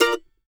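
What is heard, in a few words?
mandolin A# major, Country; Sampleheads; Whole Lotta Country 1 & 2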